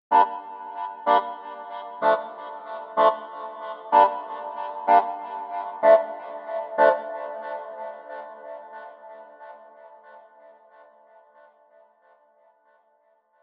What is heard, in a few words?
melodica chords; etheral melodica; soundfingers